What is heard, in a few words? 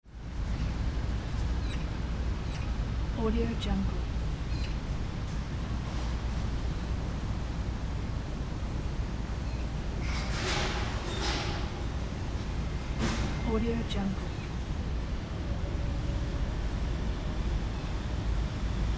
city park summer ambience; metamixmusic